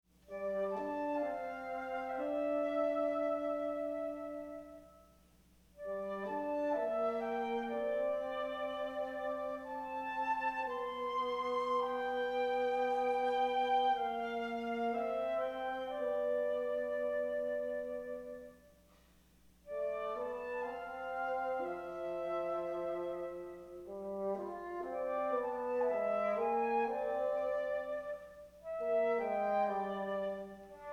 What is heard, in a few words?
Repair for flute and bassoon